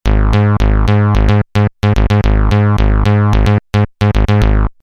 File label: syn bass